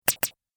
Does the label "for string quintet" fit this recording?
No